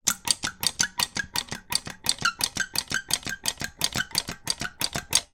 industrial metal caulking gun trigger press several times